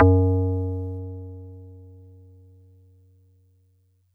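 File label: Marimba DX100 F#6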